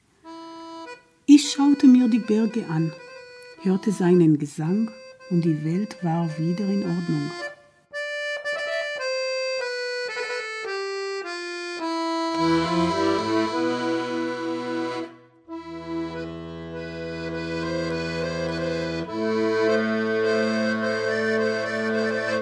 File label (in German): griechisches lied